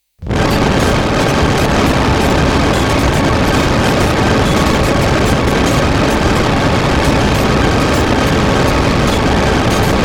casio ct 380; circuitbending; Gijs Gieskes

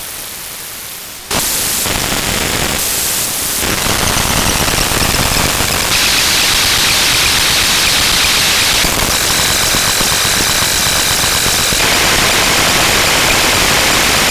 euroboy noise

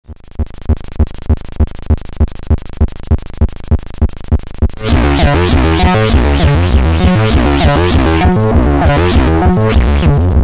acid machine; Gijs Gieskes

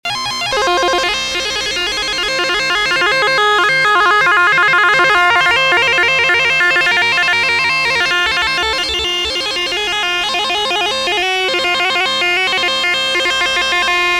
WTR Midi high arp random, wavetable radar

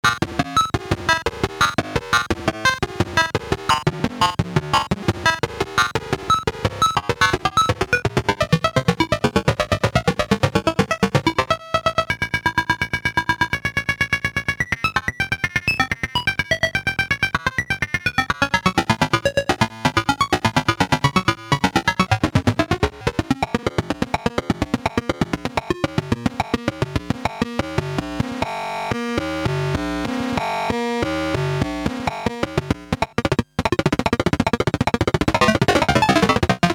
clicks, hard soft synth

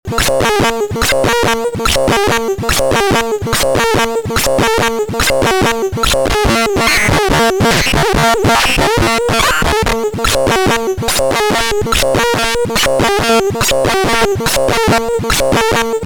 HSS2020

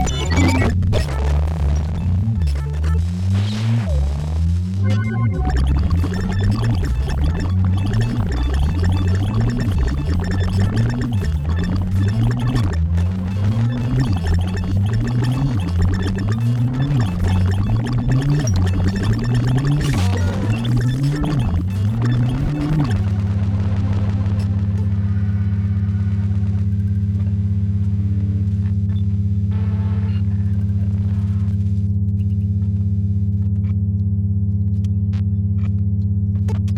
Casio CT660; circuitbending

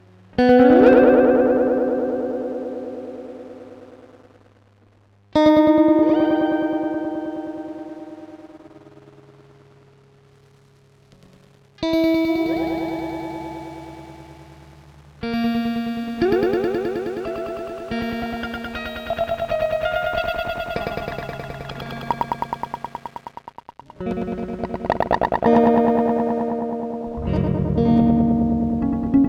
delay guitar

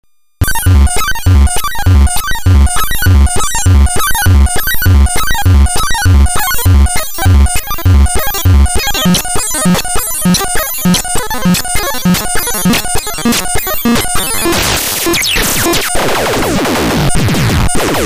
hss softsynth

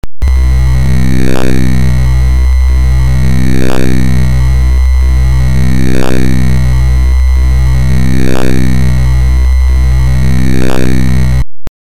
pwm, lsdj

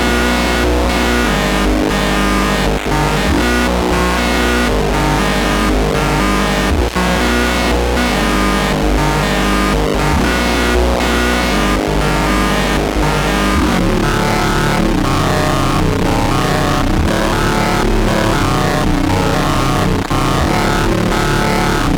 arpegio, hard soft synth

